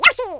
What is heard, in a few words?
yahoo; yoshi; SM64 CO-OP